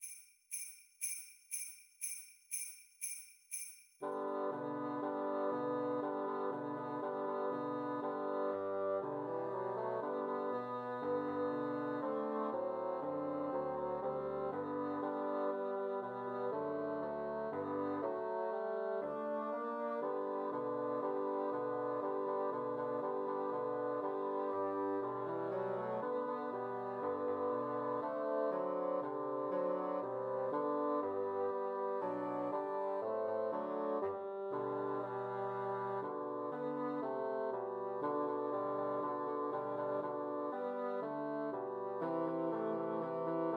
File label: Jingle Bells Christmas Trios for Three Bassoons